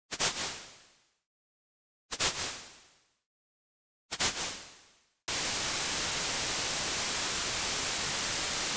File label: Test Noise 48